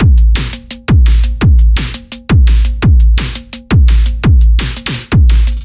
Microdrums C, 170 bpm

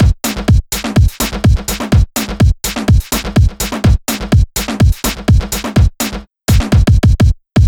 Italo Beat F

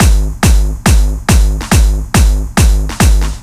Jump, hard style

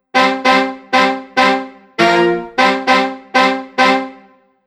Sax Hit, funk; духовой

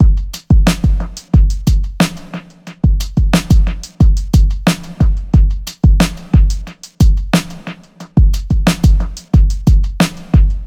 Big Snare; чиллаут

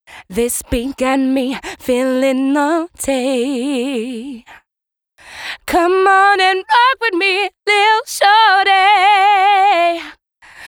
Naughty Dry, vocal

